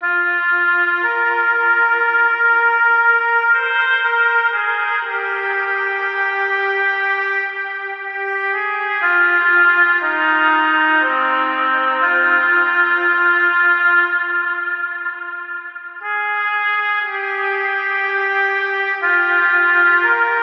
Woodwind - Oboe - Dm 65Bpm; Ghosthack; SkyRealm

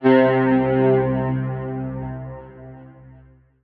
SYNTH Grid (C); Melodic One Shots; Cymatics; ANALOG EVOLUTION Beta

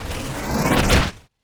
wooshes transform sweetener

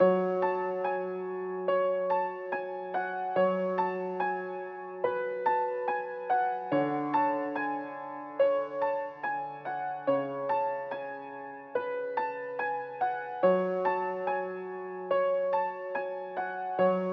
143 BPM F# Min Piano, melody loop stem; Trap; Loop Stems; Cymatics; 2022 Melody Collection; Streets

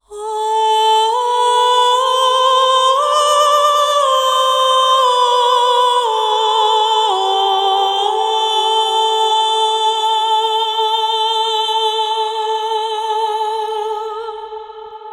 Top Line Vocal - Am 120Bpm (WET); Construction Kits; VOCALS; Ghosthack; SkyRealm; Kit 05 - Heart Of Fire

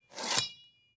Machete Out; Fairlight Sound Lib

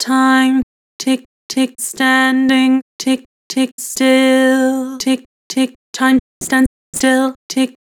Vibrato, Vocals; Harmor Vocals

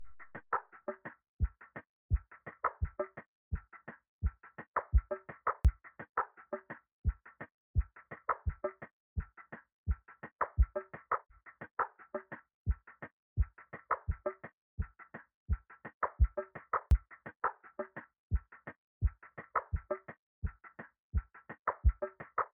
85 BPM B Min Percussion Loop; Loop Stems; Cymatics; Potion

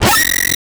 Distort

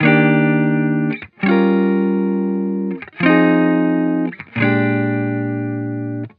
Vibrations Chill Guitar Loop 150 BPM D# Maj; Cymatics; Vibrations Guitar Sample Pack

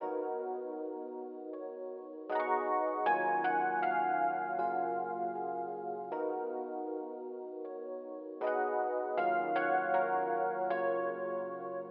157 BPM D# Min Piano; RnB; Melody; Loop Stems; Cymatics; 2022 Melody Collection; Free Your Mind

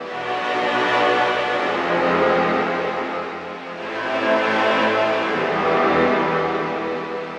Pad Loop 130 B, Techno; Loops; Pads; GhostHack; Ghosthack - Free Techno Sounds 2024

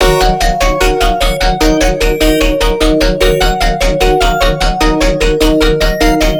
Melodic Bass Loop D# 150 Knocking, Color Bass; Loops; Ghosthack - Color Bass Essentials

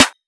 Snare C#, Chop Snares, Hip Hop; Drum One Shots; Cymatics; Cobra Hip Hop Sample Pack

